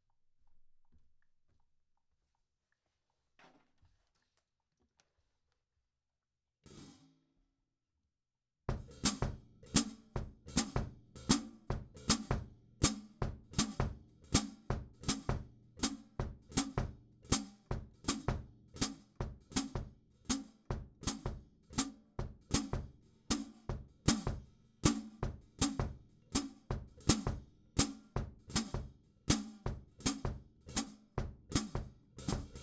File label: perc intro hat